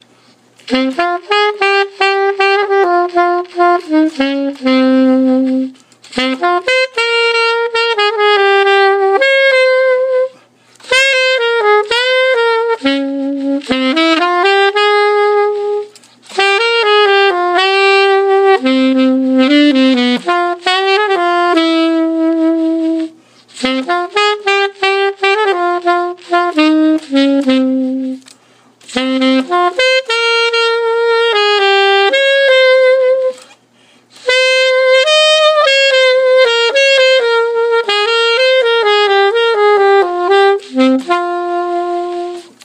samba, saxophone

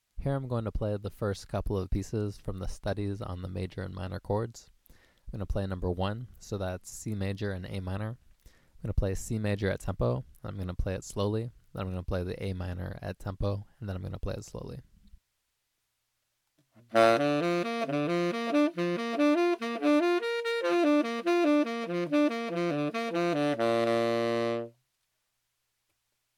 arpeggios C major A minor, saxophone

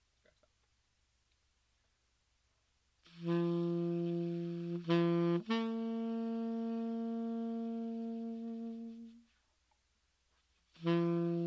taps saxophone

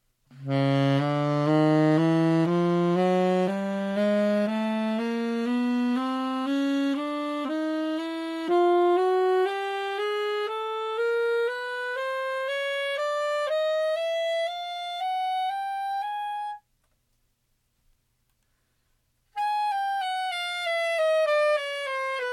alto chromatic, saxophone

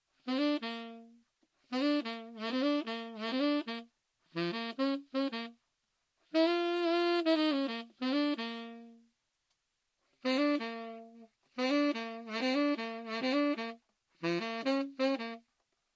these three saxophones comparison

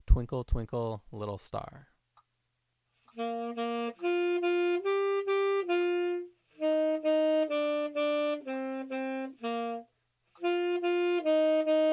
twinkle twinkle tenor, saxophone

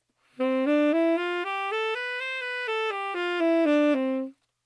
C up octave major scale, saxophone

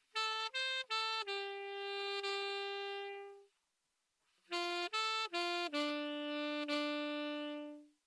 saxophone solo slow

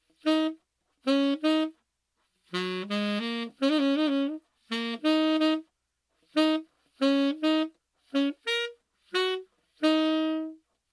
slow sax

